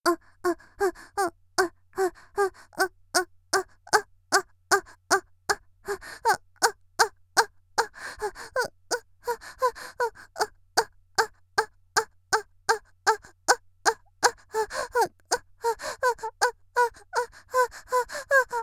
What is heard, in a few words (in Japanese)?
ピストンに合わせて「あっ」; 綾波系少女